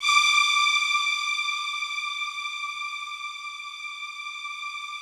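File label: rough strings D5 right, Yamaha VP-1; 90 s Sample CDs; Music Store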